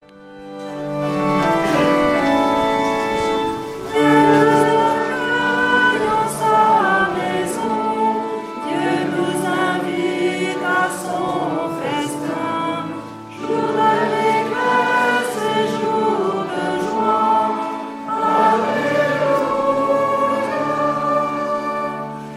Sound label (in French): chant entree